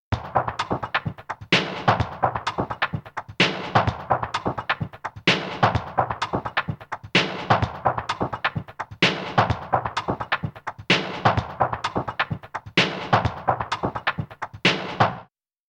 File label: house